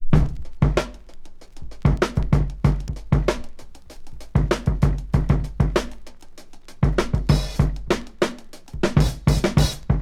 97 bpm fresh drum loop D# key, Free drum loop - kick tuned to the D# note. Loudest frequency: 497Hz